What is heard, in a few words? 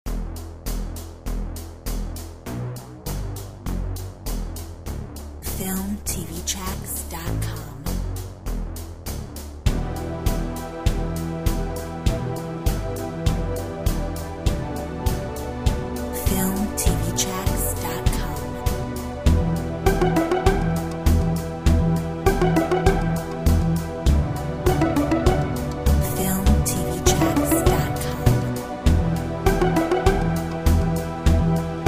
Ambient Pizzi